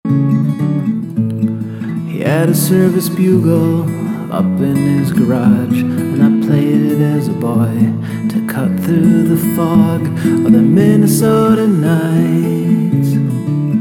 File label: bugle sample